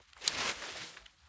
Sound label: Trudge Step, outdoor, Footsteps